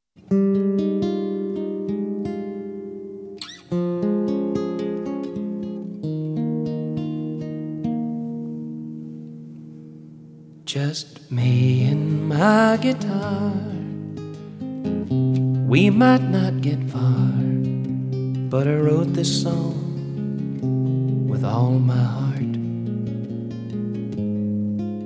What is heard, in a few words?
Guitar